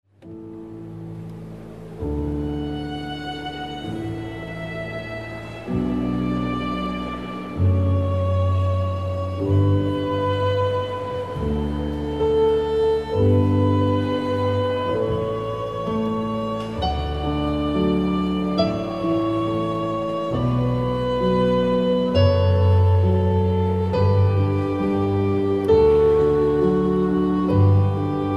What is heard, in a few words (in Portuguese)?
Piano e Cordas